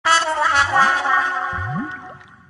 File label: toilet failure sound; the sims 1